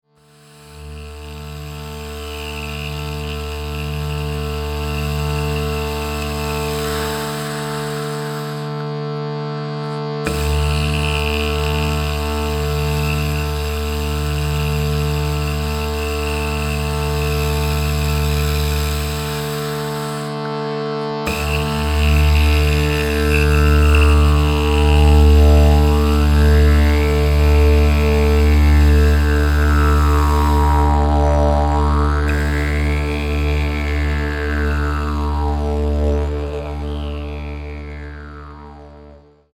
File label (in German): Klang Aerophone